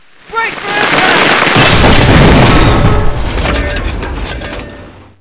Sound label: glider crash